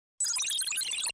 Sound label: Computer Read Out Data